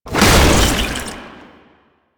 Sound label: Proto Infested Babau Underground Attack Stop, Enemies